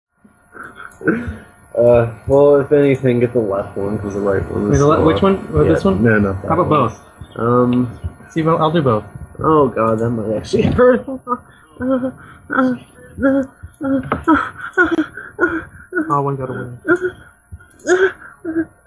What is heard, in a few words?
whimper denoised